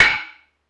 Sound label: percussion slapstick verb